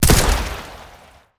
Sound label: atk inkgun